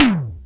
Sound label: Drumulator E Tom Mid, EMU Drumulator; 300 Drum Machines